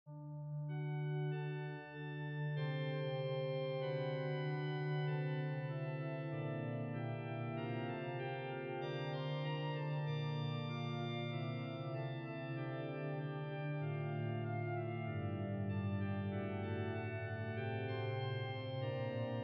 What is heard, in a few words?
organ